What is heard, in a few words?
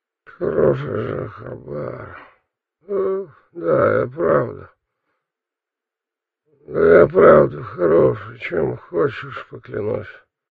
sleep; customnpcs